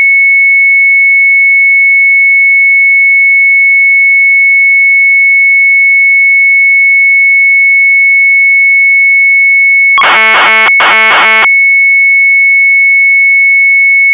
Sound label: MZ800 Tape, sharp; LOADER DP-7050A; retromelsarchive